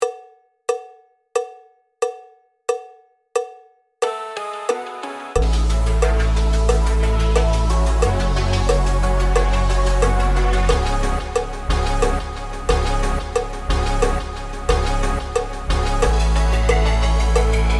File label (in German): Hip Hop Clap Song mit Click; 105 bpm